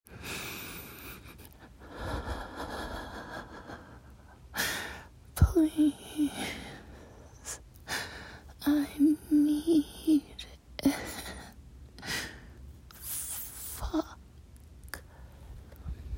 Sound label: Femme Moan